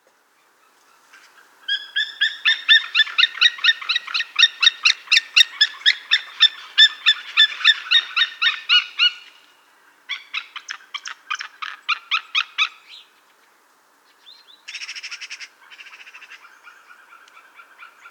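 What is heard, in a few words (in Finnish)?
merikotka, lintuäänet; Pesimäaikana kimittävä, palokärkimäinen ”kji kji kji”; Yleensä hiljainen; Terje Kolaas; xeno-canto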